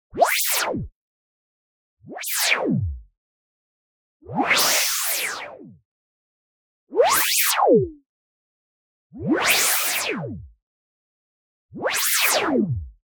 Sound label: WHOOSH Noise Formant Filter; boom library; Anime Essentials